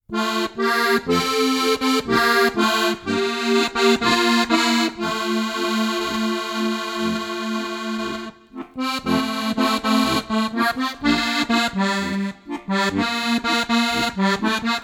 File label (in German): lied